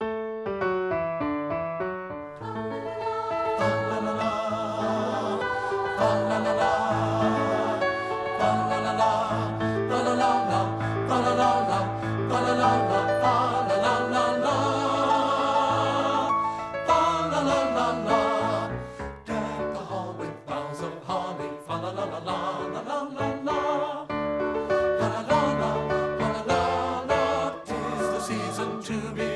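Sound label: FA LA LA FANTASY TENOR; partbypart; satb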